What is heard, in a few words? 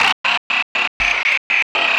Subtractive Beat